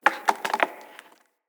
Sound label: Tree Pine Branch Break; Trees; Midrath Island; Ambience